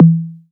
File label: Toms